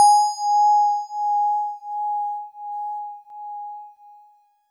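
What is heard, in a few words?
DX100 G sharp 5; David Lynch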